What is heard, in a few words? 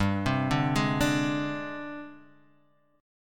Ab Major 7th Flat 5th G